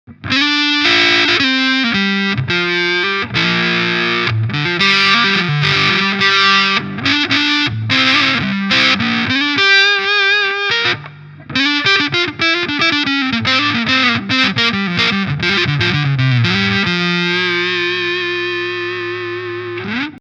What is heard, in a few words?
Electro Harmonix Sovtek Big Muff Pi Fuzz; no mid switch